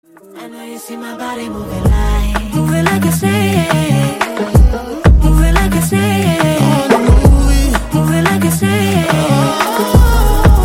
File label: Ringtone